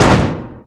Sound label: Strike